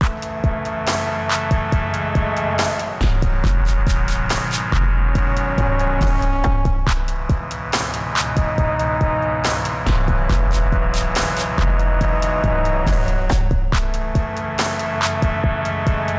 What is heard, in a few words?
Coming Back 140 BPM C Minor